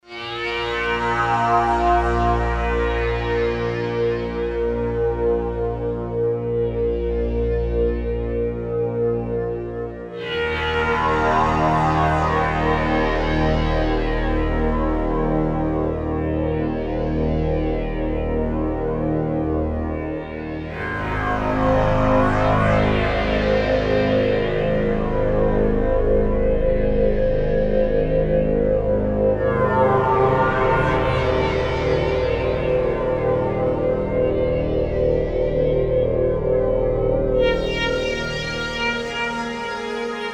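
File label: string wave, horror synth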